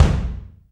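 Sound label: bass drum, Roland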